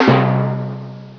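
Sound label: timpani; Windows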